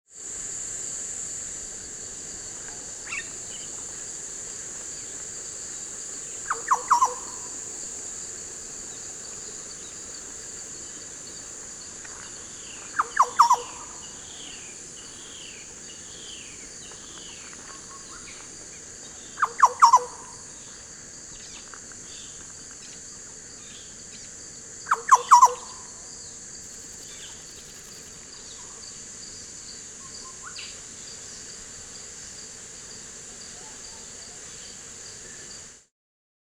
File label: Oriolus Flavocinctus; Green Oriole; Family - Oriolidae (Old World Orioles, Figbirds); Call